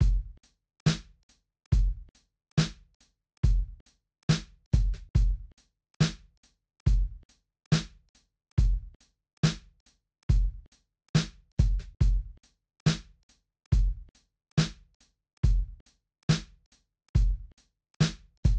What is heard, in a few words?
boombap